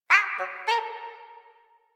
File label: Flying Robot Voice; Warframe; Quests; Veilbreaker; Ambience; Kahl Quest